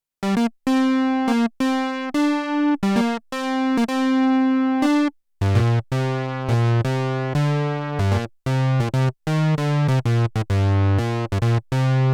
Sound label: Fat Lead